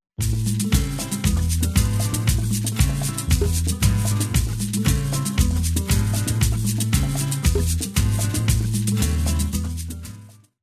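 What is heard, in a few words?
AFRICAN ACOUSTIC SWING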